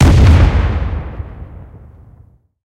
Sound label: aa explosion; War Thunder